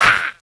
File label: damage; Foras; Gamigo